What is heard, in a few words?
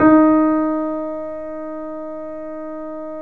3D Pianos Yamaha C7; E-MU Producer Series Vol. 5